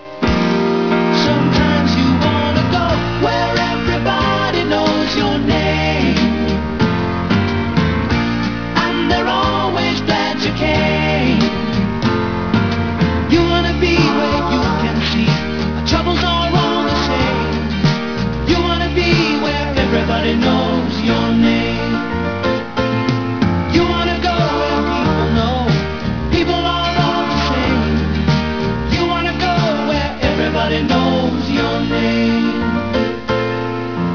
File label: cheers